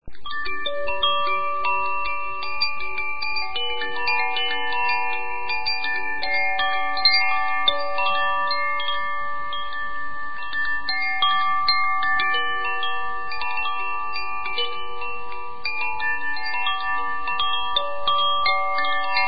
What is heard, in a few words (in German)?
shanti, Klangspiele